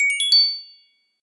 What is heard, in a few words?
star appear; game; santalicious